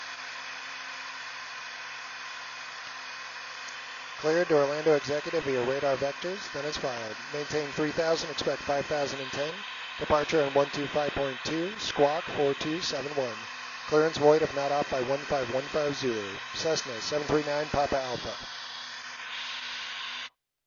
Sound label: Cessna 172 Bose A30; Zulu 4; sound comparison